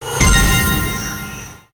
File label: nicewin; Hot Neon; slot game